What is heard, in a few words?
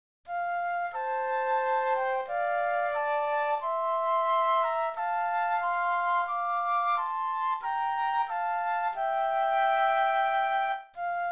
Flute Duet